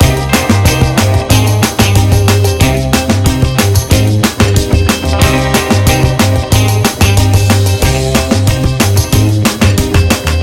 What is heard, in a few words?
Rush Hour Loop; Rush Hour 60s To 30s Loop; Burnout CRASH!